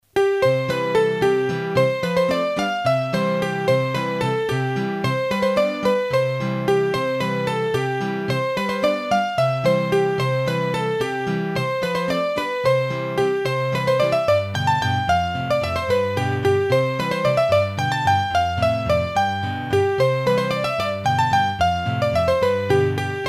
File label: Tente Redde, Bourees d'Auvergne, hurdy-gurdy; Massif Central